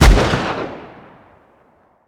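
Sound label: weapon pistol mag fire player atmo ext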